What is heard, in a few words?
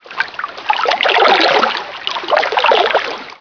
pond water; PC Gamer IT CD 41; Daikatana